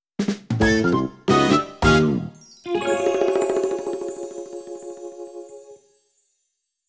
Rocket Belt Success Jingle

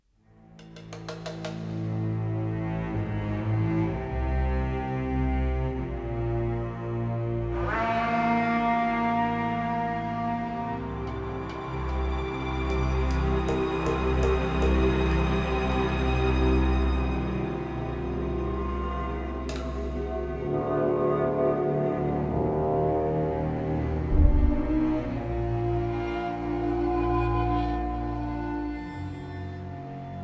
Fight Theme Ambient

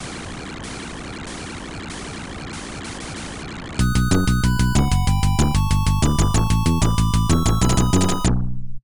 Landing Success; Argus; arcade

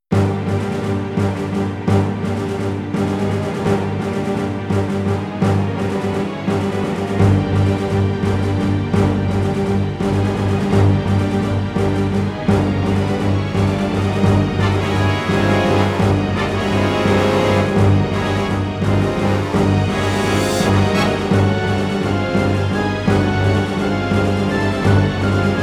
Hand Drums; Ring of Red; Konami; RPGs; Battle 11

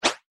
Interface Swipe; Infernal Radiation

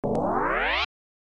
Level Start; RoboCop: The Future of Law Enforcement; arcade

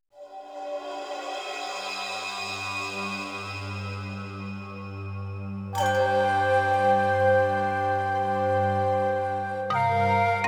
Aztec - Old mights; anno 1503 gamerip

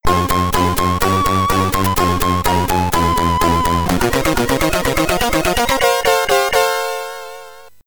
Jingle, Commodore 64; Nighthunter

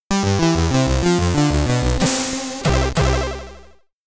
Restart, SID Stereo, Commodore 64; Neuronics

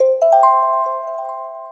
Upgrade; Hellbreachers; PS4; Windows; Xbox One; Xbox Series X/S

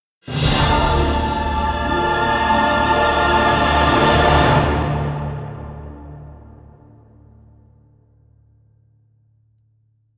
Owl Outro

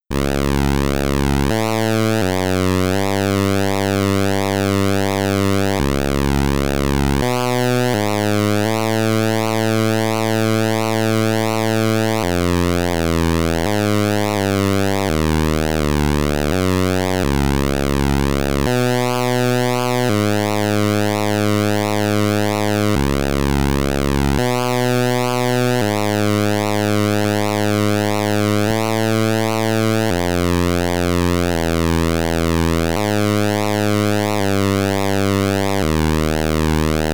Cave Theme, SID Stereo; Flash Gordon; Commodore 64

